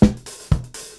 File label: breakbeats